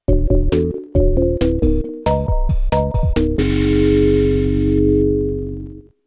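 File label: game over music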